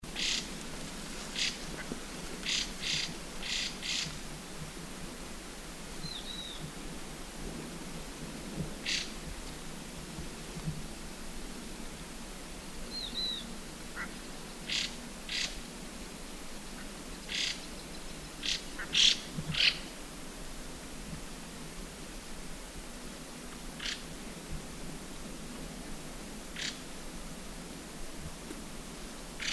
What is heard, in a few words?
Chinese Hwamei Ssp Canorus Call; garrulaxe hoamy garrulax canorus; Guangxi Shanli